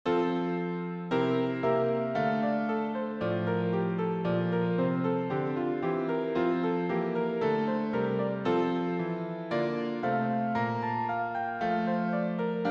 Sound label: hymn